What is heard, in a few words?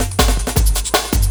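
LOOP snare drum, Drum & Bass, 160 BPM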